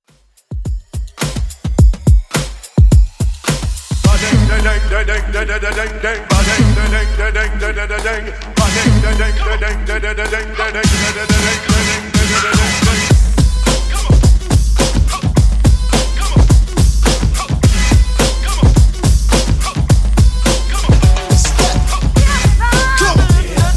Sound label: 90s DJ Friendly Redrum 106bpm